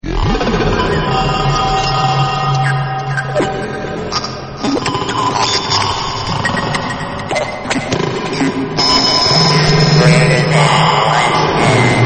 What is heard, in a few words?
ambient